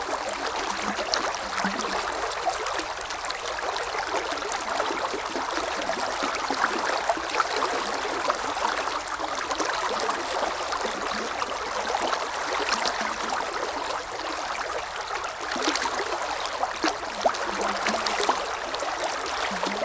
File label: river; Golden Crayon Sounds